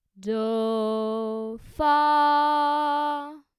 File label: Tonic subdominant